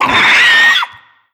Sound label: taunt screech, creatures; vox